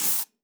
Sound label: Spray Hiss